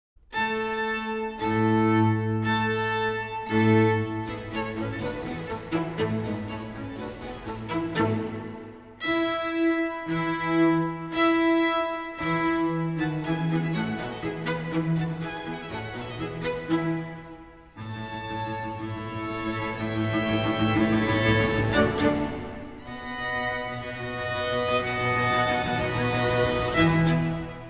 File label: Concerto In B Flat For Strings, Allegro Molto Marcato; Vivaldi; RV 163, "Conca"